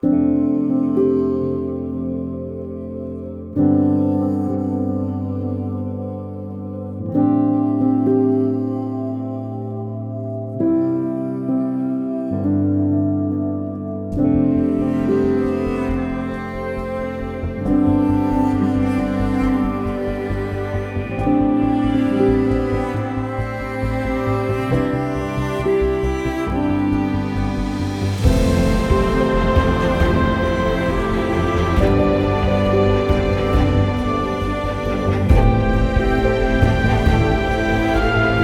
music

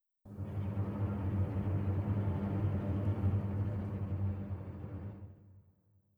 basses trem; Netease

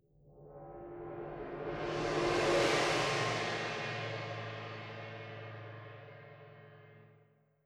gong; Netease

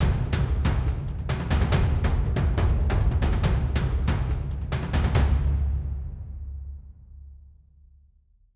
drums rapid; Netease; morale full

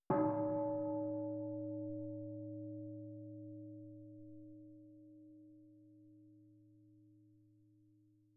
bell; Netease

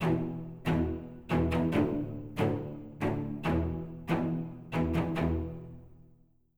celli; Netease; morale full

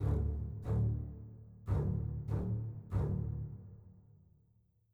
basses; Netease; kill boss phoenix

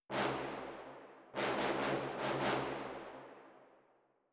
trombones; Netease; gank

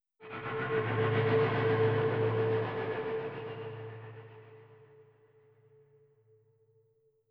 ambience; Netease

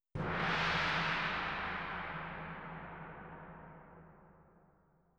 gong; Netease

